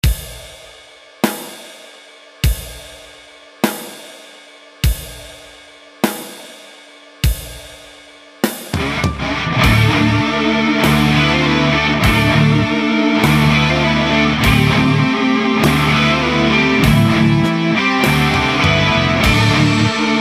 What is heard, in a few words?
Moody Sound Clip CRUNCH